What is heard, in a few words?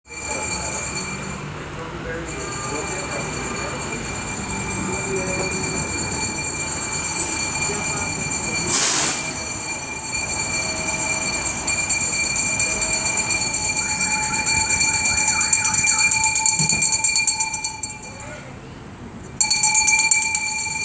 trash